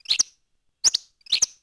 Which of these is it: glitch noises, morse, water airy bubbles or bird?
bird